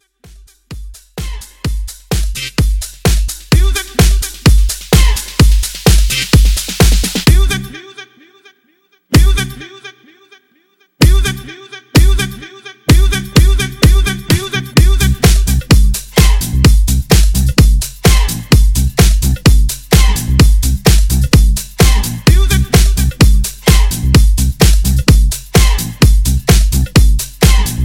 Dance Mix 128BPM

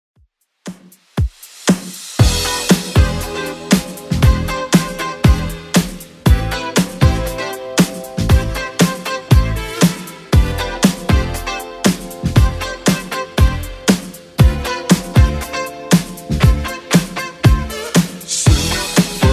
80s Redrum 118bpm

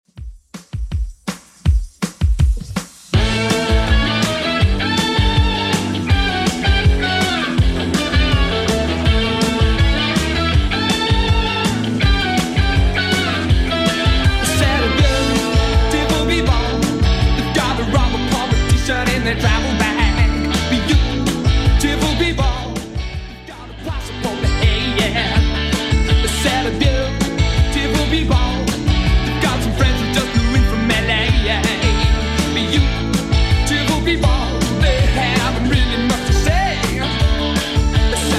80s Rock Redrum 162bpm

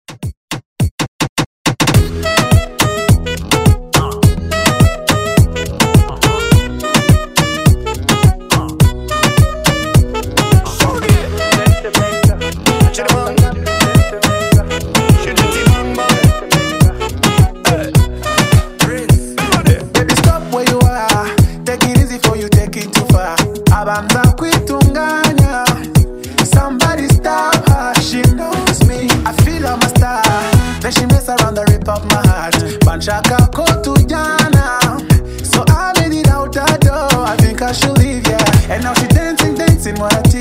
Moombahton Redrum

